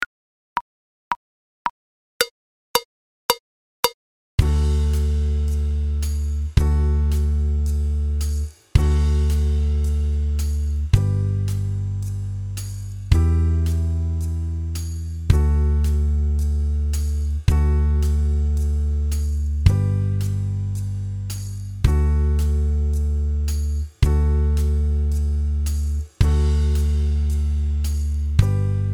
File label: backing tracks